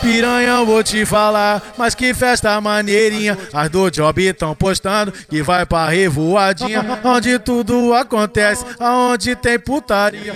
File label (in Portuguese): funk sertanejo